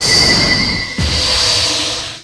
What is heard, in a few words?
Energyball